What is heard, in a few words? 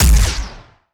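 Laser gun Fire; Lasrgun Hyperion Fire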